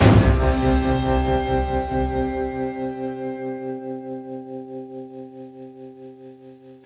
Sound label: Combat Loop End; Onboarding